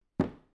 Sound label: Jumping On Wooden Floor